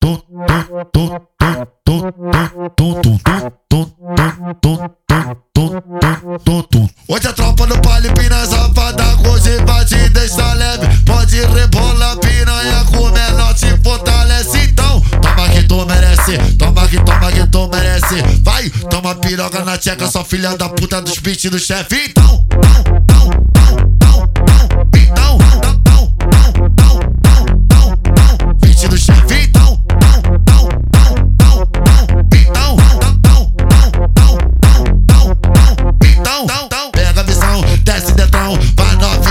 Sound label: phonk